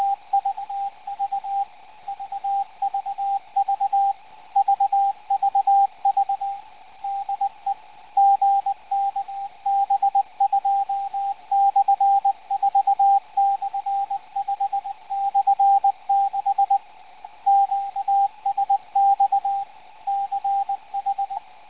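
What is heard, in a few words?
Frequency marker, Marine Audio HF; Portishead R GBK2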